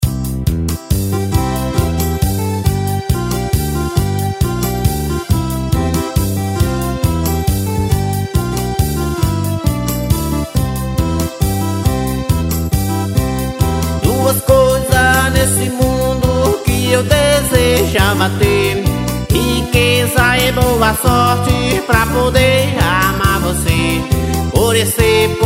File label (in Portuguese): teclados